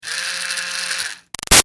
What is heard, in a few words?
Toy Fairy Wand CU Run Short; Miscellaneous Toy Sound Effects; Big Room Sound